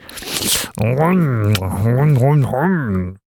Vampire Attack Vocals

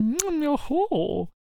Chariot Attack Vocals

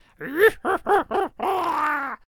Scarecrow Attack Vocals

